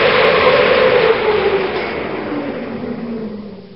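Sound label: car brake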